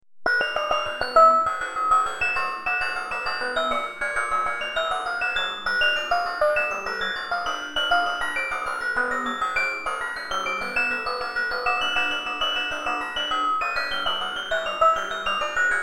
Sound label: Nano Piano; Solo Improvisations